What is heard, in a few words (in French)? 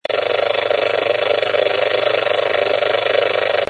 10MHz avec panneaux; Brouillages; Panneaux Solaires; ChaumesEnRetz